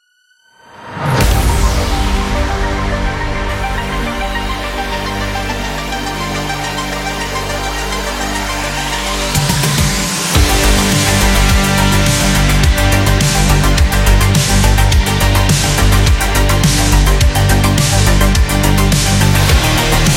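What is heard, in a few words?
Cyberpunk Game, Modern lo-fi and retro background music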